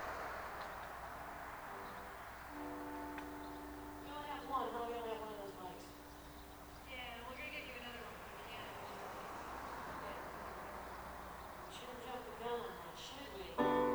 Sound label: soundcheck; leeds